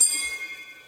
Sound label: bell plate